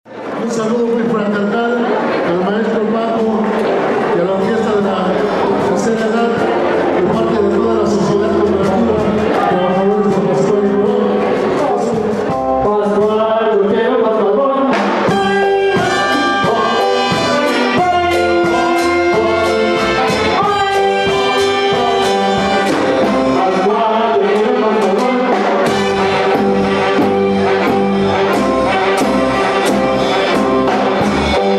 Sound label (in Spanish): Cumbia pascual